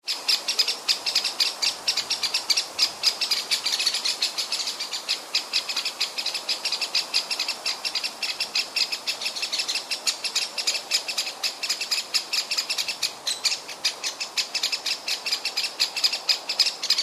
blackbird fuss and alarm calls

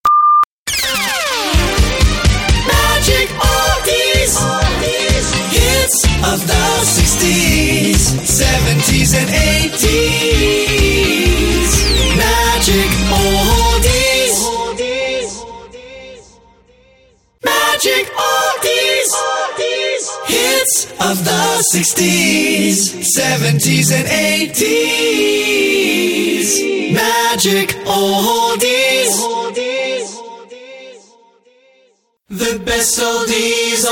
oldies jingles

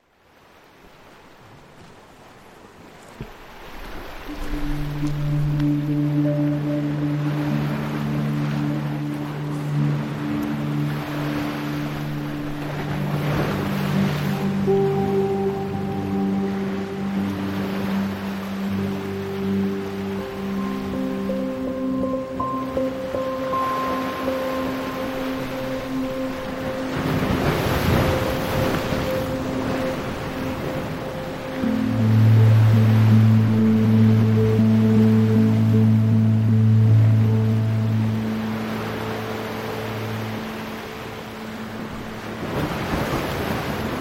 duet for breaking waves and the horizon

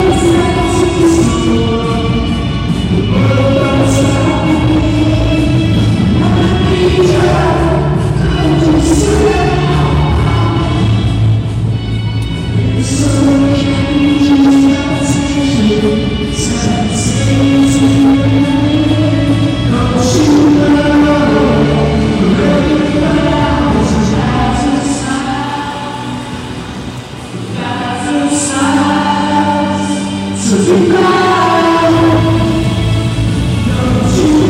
Christmas lights switch on sound check; manchester

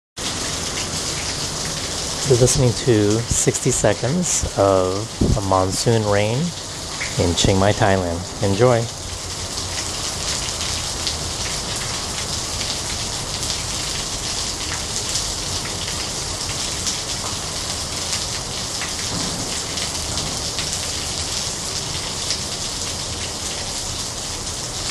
sound of monsoon rain in chiang mai thailand

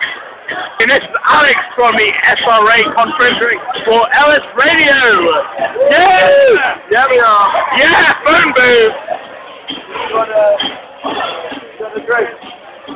phone

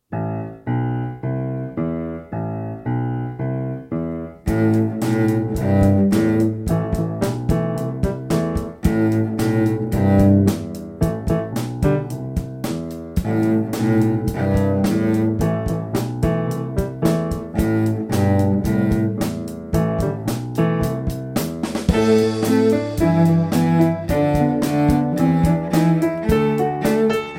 rhythm fever cello

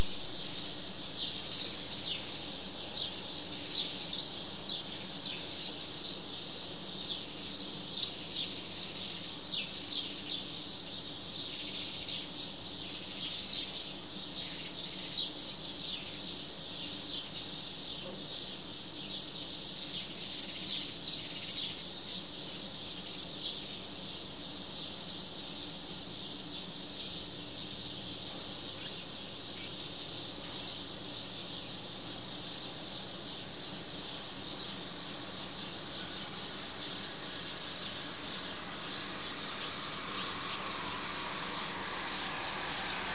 little birds flock at early morning

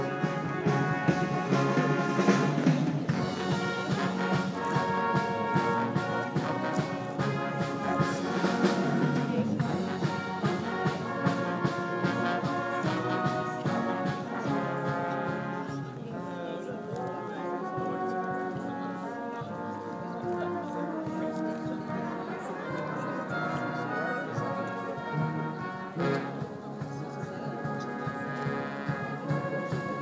percussion

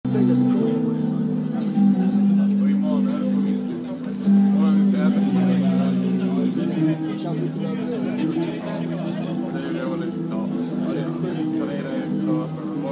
guitarra portuguesa

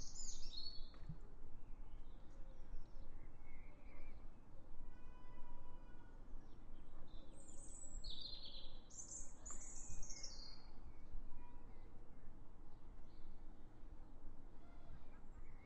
beautiful birdsong

